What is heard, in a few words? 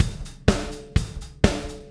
125 Disco Drums, Fast Tempo; Pop Rock; Digidesign; Pro Tools; Pro Tools Essential Loops